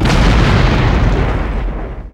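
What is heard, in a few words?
Explosion Large; SFX Kit; Destruction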